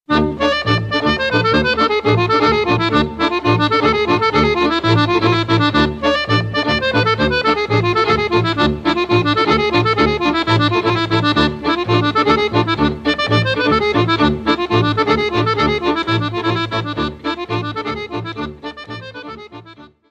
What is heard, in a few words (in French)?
Horo - Bulgarie